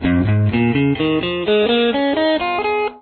c scale pattern example, guitar

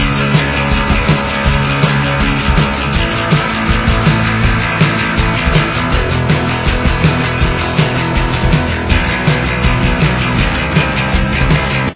chorus, guitar

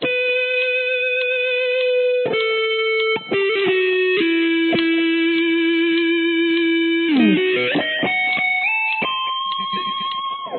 lick, guitar

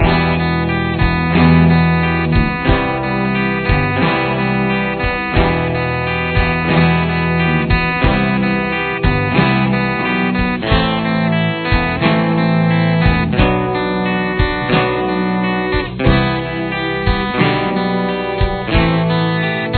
acoustic bass

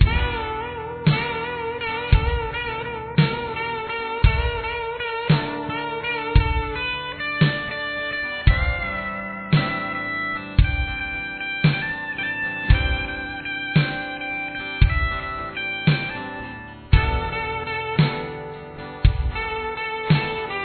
solo

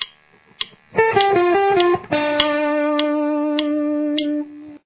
outro

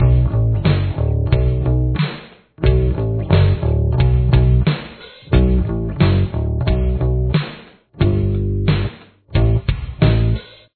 bass

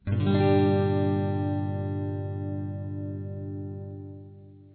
G minor open, guitar

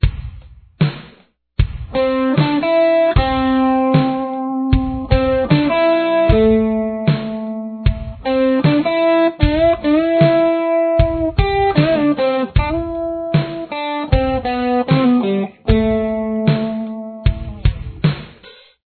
intro guitar

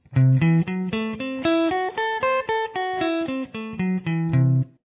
Arpeggio Dm style, guitar

